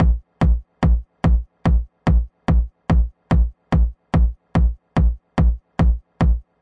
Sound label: 145 verby kicks; wobble techno